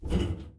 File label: boots metal; Apotheorasis